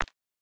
fall; YummiCookie; French

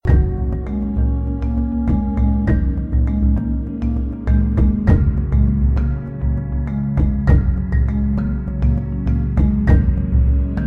music menu; papersiomania

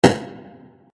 explode; inlogic; ballsandbricks

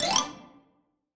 rainbow candy shoot; sweetcandymania

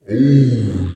ohh; Rolling-Panda

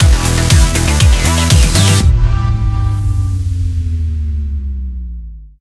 Music Win; Rally Champ

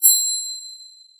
shield collect; Ninja-Action-2

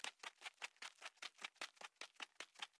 snow run soft; olaf; frozen rush

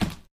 object hit cone; lightning speed

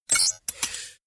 force button; star-wars-rebels-team-tactis; ezra

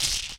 chips win; inlogic-blackjackmaster

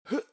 jump; subwayrunner

